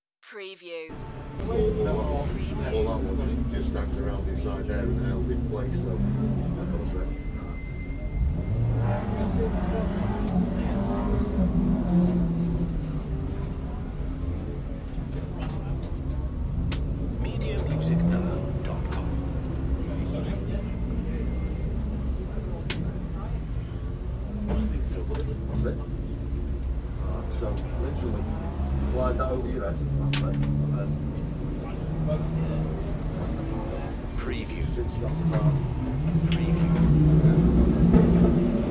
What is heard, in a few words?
Rally pit ambience; beanstalk audio